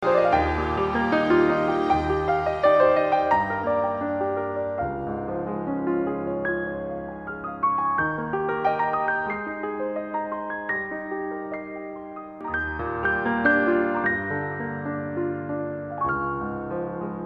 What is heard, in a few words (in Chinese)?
轻柔的背景音乐